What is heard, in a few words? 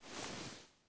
body Slide smooth